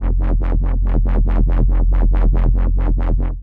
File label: wobbly dubstep bass with modulated filter 140bpm